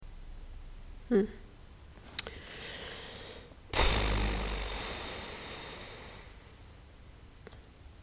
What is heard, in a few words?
hm sigh